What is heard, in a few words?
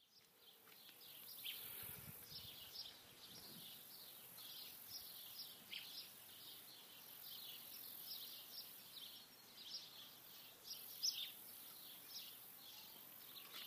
Sound of The Prairies, nature sounds, Canadian Prairies; [for sleep, meditation, or to help relax]